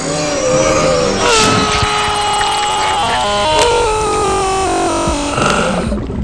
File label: scream